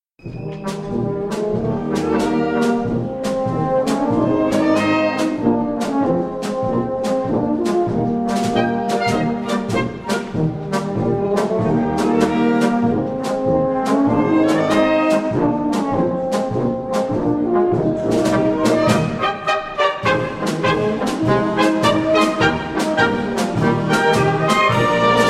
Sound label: Polka; Slavonicka